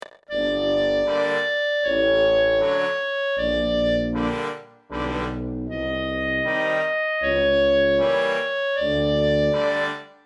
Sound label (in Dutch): Spreekgezang